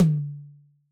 Yamaha DJX 2B, Mid Tom H

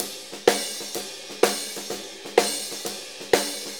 Blues Loop, Full Kit Loops; Drum Tools

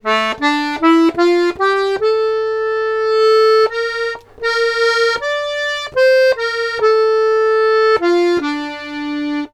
Accordions; USB Soundscan vol.40; AKAI

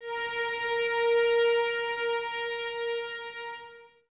violins A#4, string ensembles; Miroslav Vitous

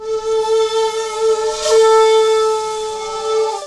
ethno, sound ethno

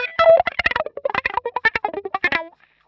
wah grind; PowerFX - Nu Jazz House

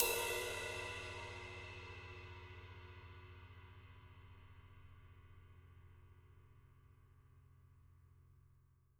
JZ RIDES, Living Drums; Sampleheads; Peter Erskine

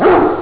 dog; ST-XX Amiga Soundtracker Sample Packs

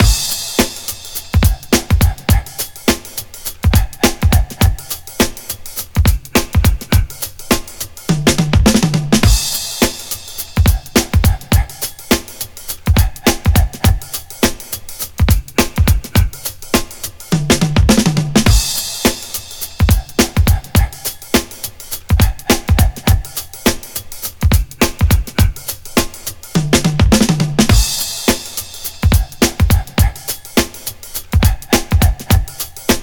104 Funky Breath, Breaks; Lockdown Breaks

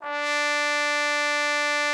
trombone D4; Xsample VOL-3

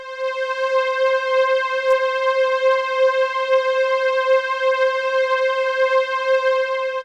STRIN, SYNTH, Trance; AMG - Global Trance Mission VOL-2

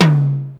Tom Hi Mid, Ensoniq Mirage drum machine

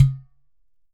GHATAM; Q Up Arts; Denny Jaeger Private Collection

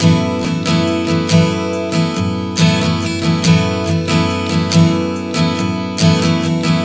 Strum 070 F#m, One Chord Strumming 070 bpm; Acoustic Guitar; Sonic Foundry (Sony Creative Software); Songwriter Acoustic Guitar Companion

